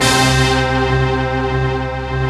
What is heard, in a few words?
BRASS HIT; East West - Global Sounds; VFX BRASS